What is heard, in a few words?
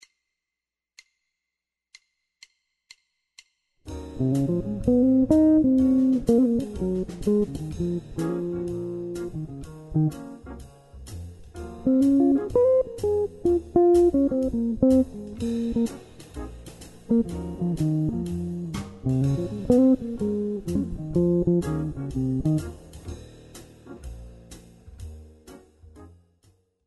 jazz guitar improvisation; practice routine